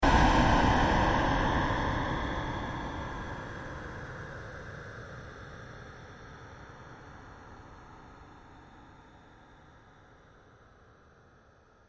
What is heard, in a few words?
pulse fade, Various sweeps, pulses and drones; Rises and Dives